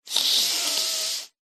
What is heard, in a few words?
human male inhale nasal hard forced slightly blocked; zapsplat